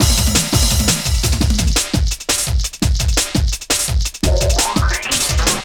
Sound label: Swift Beat, Drum & Bass, Beats & Breaks